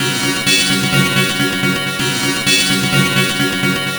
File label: Whirling Guitar; Strings; Sonic Foundry (Sony Creative Software); Myths of Technology